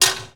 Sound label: Hammering, INDUSTRIAL